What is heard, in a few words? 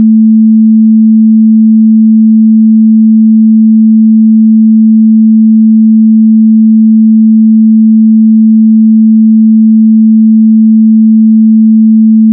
Cello 220, Сигнал 220 Герц (A) для настройки виолончели